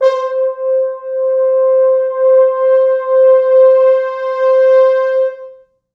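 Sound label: 4 French Horns, Horns; C4; East West; Quantum Leap Horns Sax; 4 FrHorns MOD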